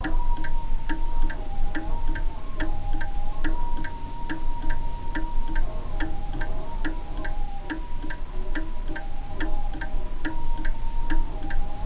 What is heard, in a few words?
Atmo